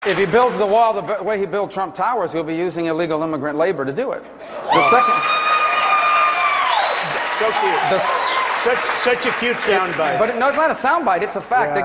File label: screamer; No Agenda